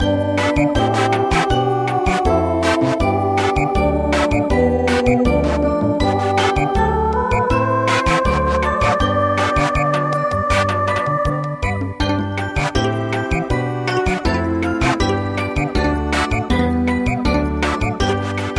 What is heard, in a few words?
Samba; Aegukga